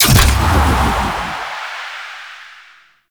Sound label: Void Dragon Jump Off; Enemies; Gameplay; Warframe; Duviri